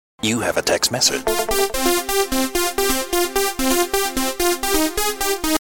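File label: trance message ringtone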